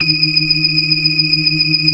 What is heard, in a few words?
BLOOD OCT R, ORGAN